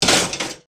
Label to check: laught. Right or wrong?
Wrong